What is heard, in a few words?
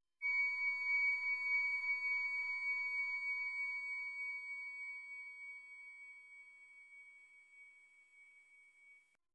a single short high pitch, crystal